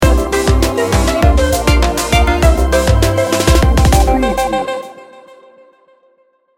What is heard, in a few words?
electro motion sting bumper